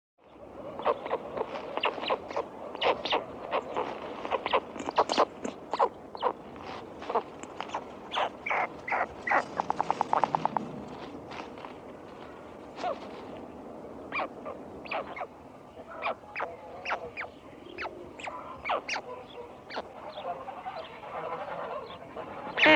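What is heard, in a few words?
stork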